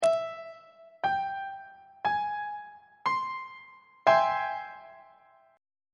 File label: a flat augmented major 7th 2nd inversion; on treble clef